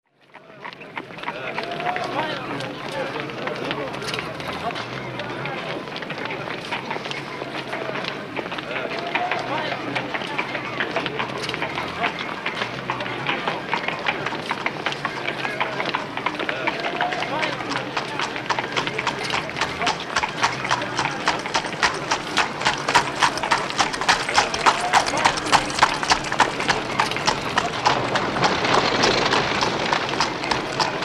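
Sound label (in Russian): лошадь с гружёной телегой едут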